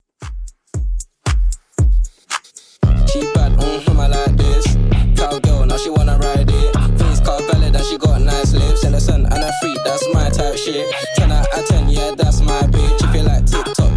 Top40 Redrum 115bpm

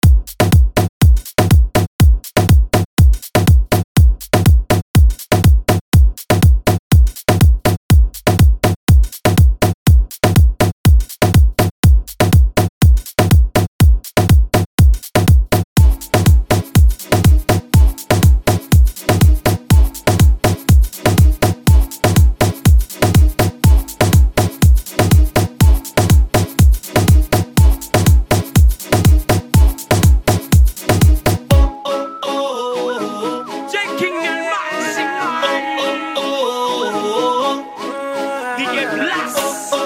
Latin Remix

